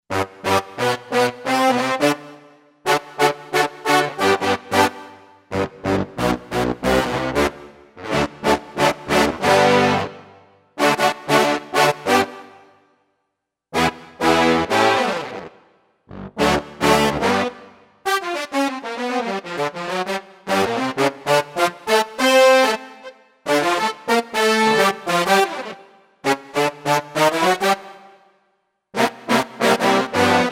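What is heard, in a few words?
Brass Section; Roland SC-880; Factory Patches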